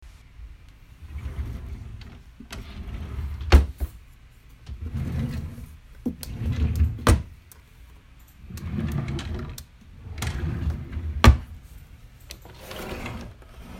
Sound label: Drawers Opening And Closing